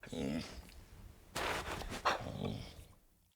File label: Pack Wolf Idle Grunt; Animals